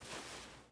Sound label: ui pickup cloth; Rust